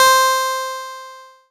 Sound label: C5, Synth Line; NiceBeats